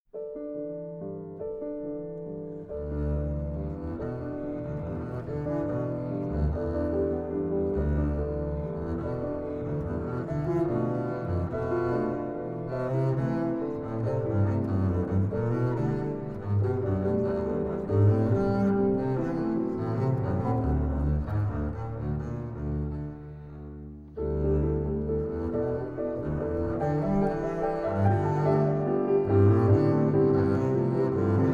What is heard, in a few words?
Valcik; ertl 30 miniatures; bass piano